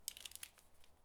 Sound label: chemlight shake; Escape from Tarkov